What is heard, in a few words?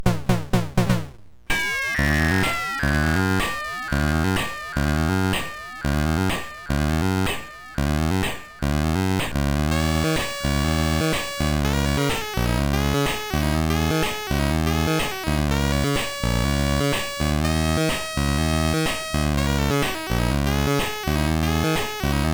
Sax, MOS6581R2